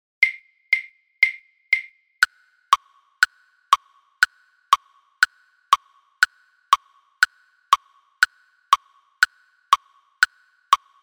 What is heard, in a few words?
etude click 120 bpm; etude no 5